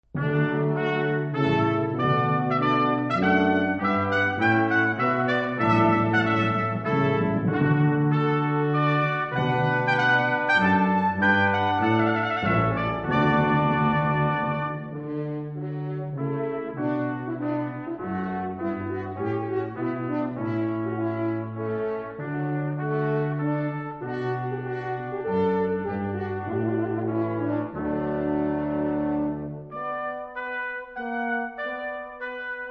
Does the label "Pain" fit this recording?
No